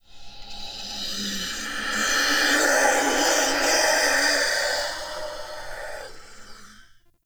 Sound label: Binaural Whispers; Warframe; Shadowgrapher